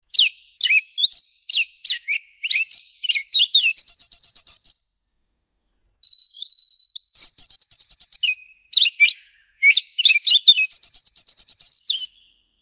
romantic jazz with bird

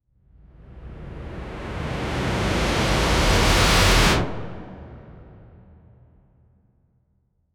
dramatic whoosh, transitions

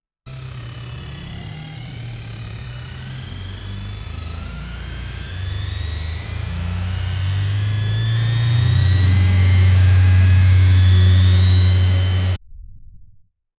sounds of animatronic

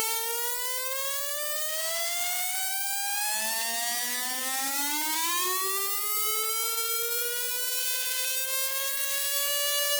punchy short scale climbi; musical

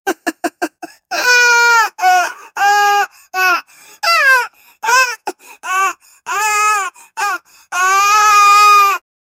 boy crying

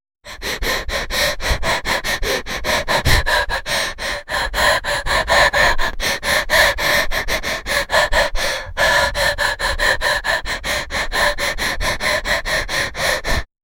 cinematic stealth escape, stealth

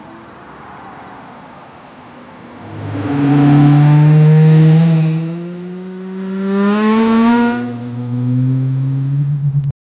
car speeding sports car

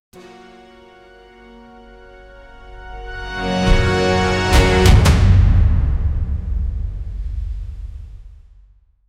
powerful divine energy, orchestral hit

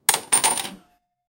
shop open sfx coin sounds

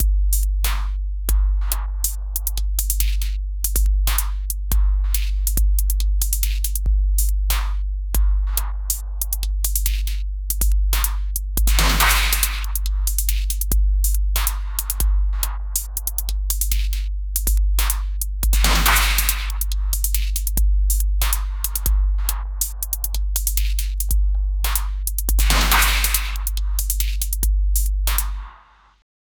desert up beat track

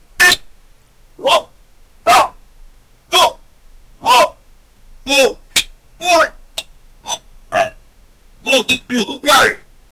the sound of a mans

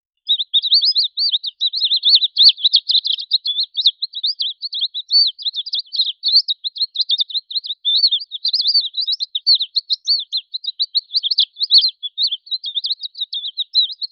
cheerful chirping of smal, birds

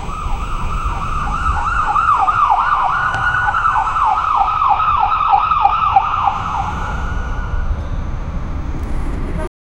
ambulance fast coming clo

